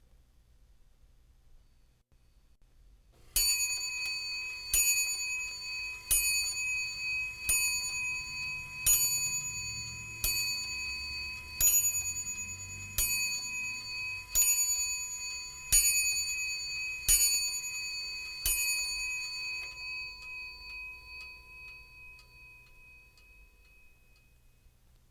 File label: Grandfather Clock Strikes Twelve; Jac Holzman; Authentic Sound Effects Volume 2